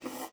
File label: GUITAR FX; Xsample VOL-2